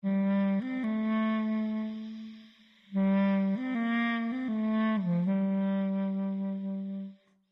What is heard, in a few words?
Dialog; the walking dead season one